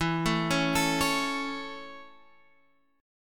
E 6th Suspended 4th

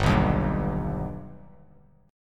G Augmented; G+ strummed; 3,2,1,0; JGuitar

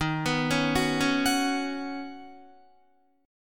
Eb Minor 7th Sharp 5th Eb down Guitar; arpeggiated

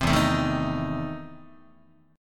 E Major 7th Suspended 4th Sharp 5th, Guitar

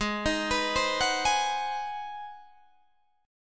G sharp 7th Sharp 9th, Guitar, Open D

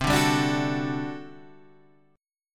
G Major 7th Suspended 2nd Suspended 4th C

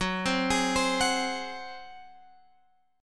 Gb Suspended 2nd Flat 5th, Guitar, Open G; 16,17,18,17,19,x; down; chord shape

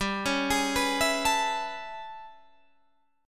A Major 9th G, chord

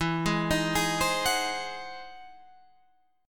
E Augmented Major 9th, Guitar, Half Step Down

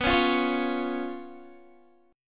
B Suspended 2nd Flat 5th, Guitar; strummed; 4F,4A,5C,5G,5C,6E

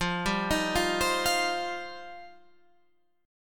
F 7th Suspended 2nd, Guitar Standard; F7sus2; arpeggiated; JGuitar

